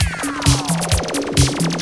lazers, audioloops

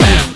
bam, audioloops

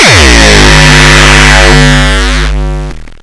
Noise Kick

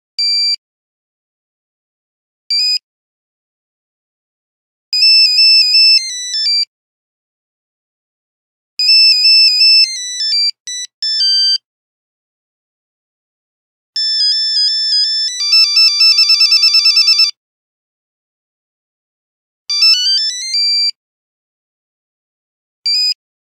Nokia Buzzer; 5110; Windows Phone apps; Dawn

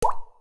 Water MMC removal; Nokia Ringtones; Audio themes

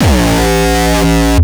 kick; shock. Ultimate Kicks vol. 2

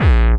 bombo, kicks, Hardcore

